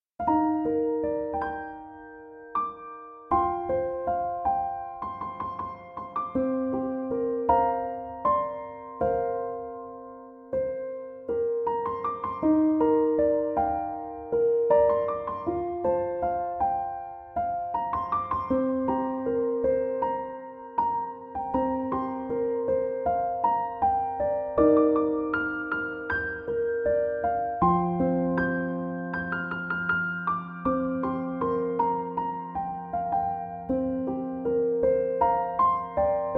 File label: piano instrumental